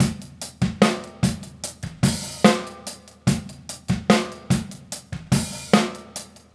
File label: Straight Kit, World Pop Beats